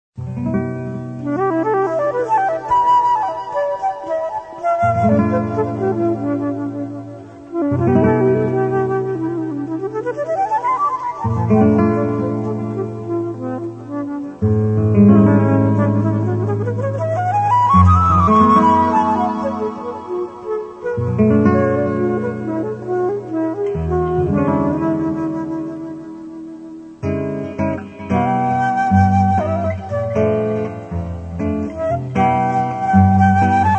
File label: duo flute guitar